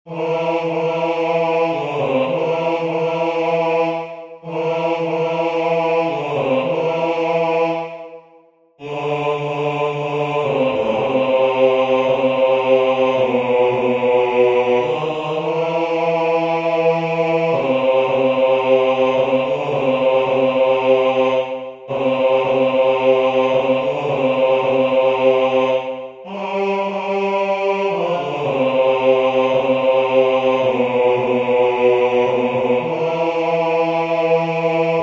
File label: Basses; Companero